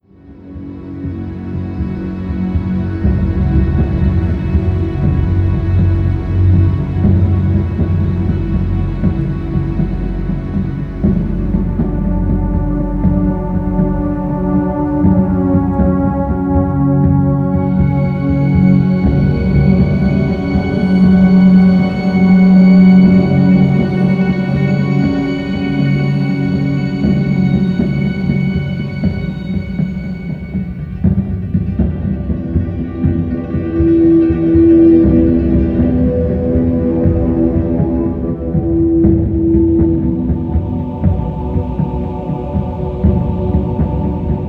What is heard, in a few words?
suspense; Call of Duty Modern Warfare Remastered